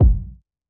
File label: Hiphop kick; Reverb+EQ+Comp+Limit